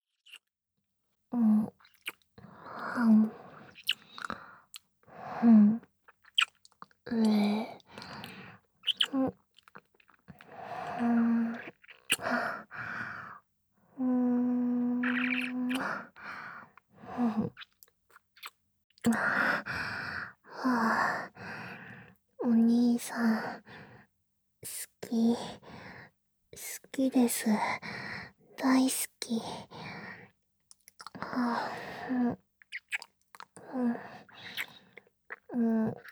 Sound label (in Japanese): 恋人同士のラブラブキス; 冷淡系魔法少女体验版; 日语版; お兄さん…♪ 好きです…♪ ちゅっ♪ ちゅっ♪ むっちゅぅ～～～…♪